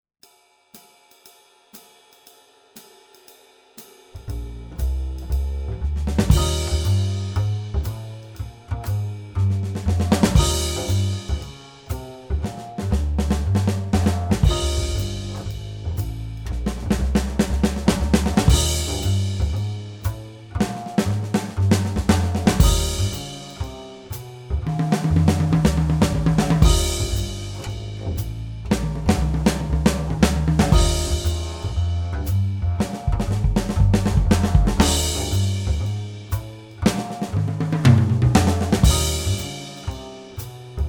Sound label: Swing, Drum Fills; Essential Drum Fills (Erskine)